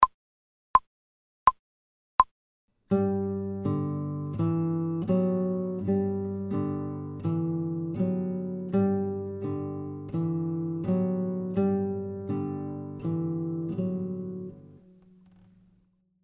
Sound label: New Note F slow, guitar; without metronome